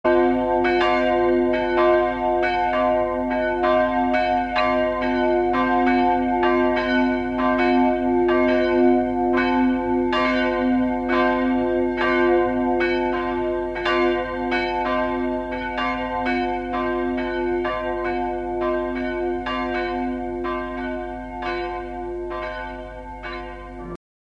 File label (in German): laeuten; kilchberg